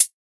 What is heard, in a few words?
Royality free pedal hi-hat tuned to the F note. Loudest frequency: 10587Hz; clean hi hat f key; drum single hits one shots; hat samples